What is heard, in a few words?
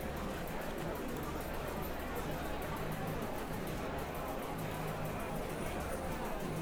live, drums; virtual drum sounds; custom drums